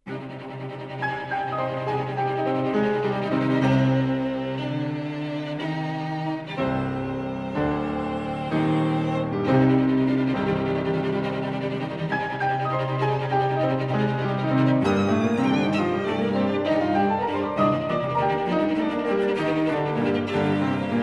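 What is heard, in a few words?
piano trio; lekeu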